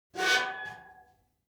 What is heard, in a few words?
object metal squeal saturated short; smartsoundfx